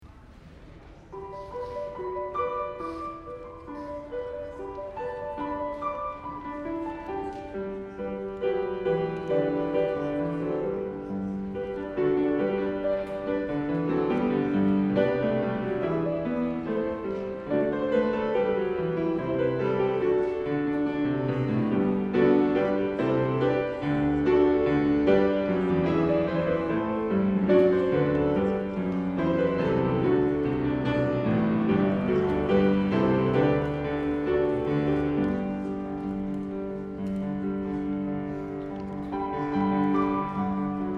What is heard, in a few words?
Piano; Angels We Have Heard On Hi